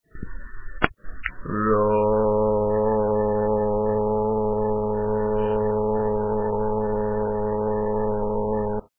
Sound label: Bass, barbershop tags